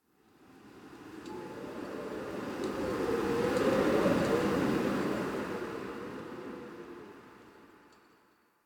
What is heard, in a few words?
Exterior Winter Wind One Off; Warframe; Ambience; Nineteen Ninety Nine; DE